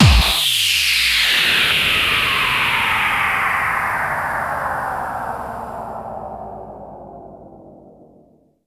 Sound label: Bassdrums FX, FX Kicks; Vengeance Samples